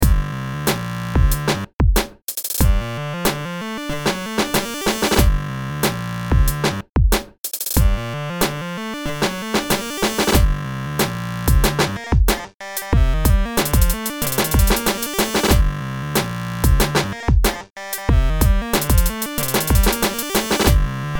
Hip Hop

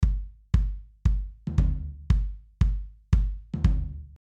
Breakdown

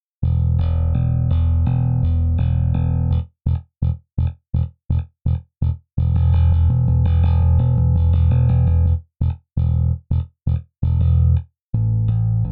J Bass Defoult setting finger

